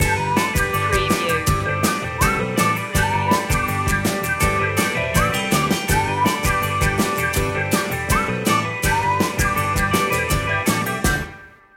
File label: upbeatful loop; rock; folk-rock